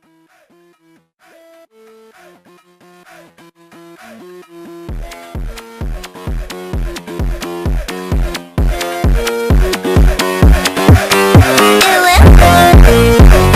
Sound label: Phonk